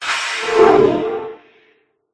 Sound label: wound